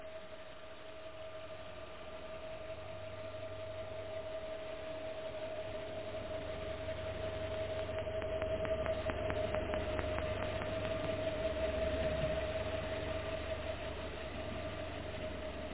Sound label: boat noise, antigua